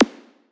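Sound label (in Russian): глухой взрыв лазерной световой гранаты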